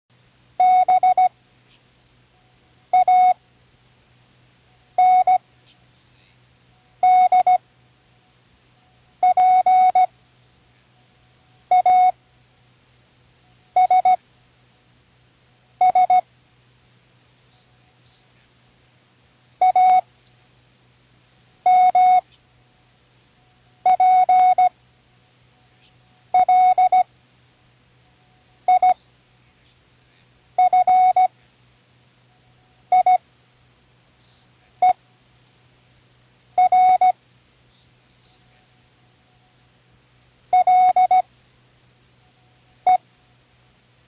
words, Morse Code; Tune in the World

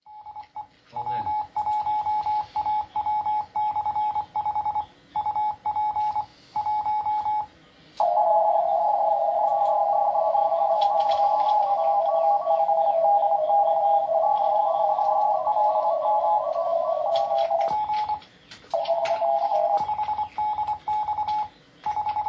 20 Meter CW

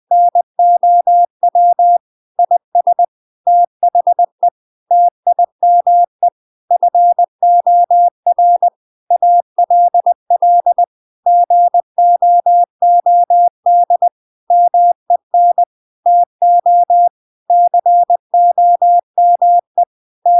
Now is the time - 20wpm, cw

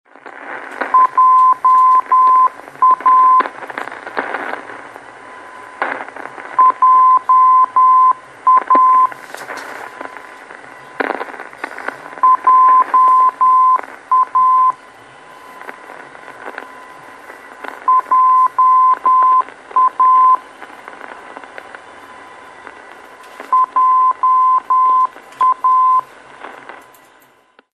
Beacon

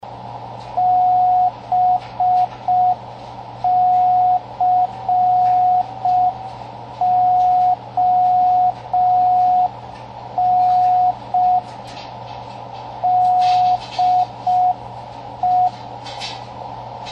Slow Beacon, cw

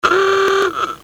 pierce arrow ahooga horn

